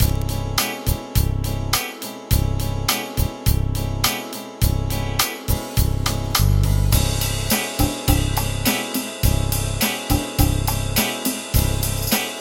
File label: 8Beat 1, MIDI; PSR-540